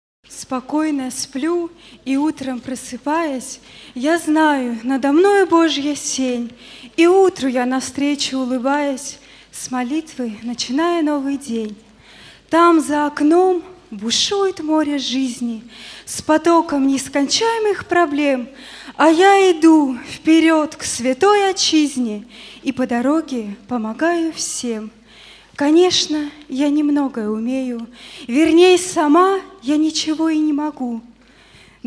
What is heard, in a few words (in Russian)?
Спокойно сплю, молодежь; молодежь г. Железногорск; Молодёжное общение г. Курск